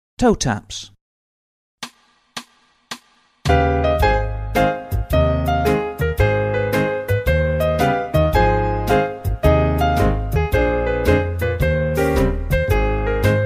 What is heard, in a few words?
Toe Taps, tap